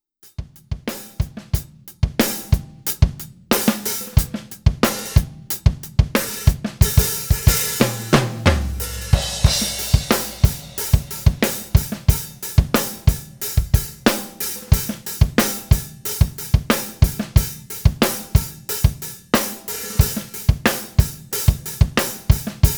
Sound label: rock funk syncopated